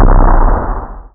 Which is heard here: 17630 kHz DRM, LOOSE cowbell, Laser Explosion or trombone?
Laser Explosion